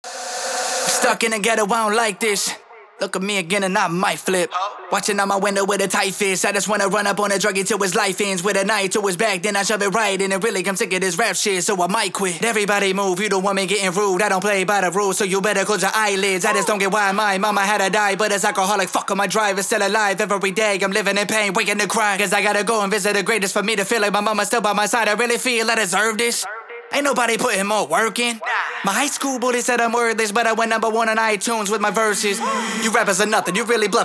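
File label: hip hop 120 A flat minor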